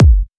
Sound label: Bassdrum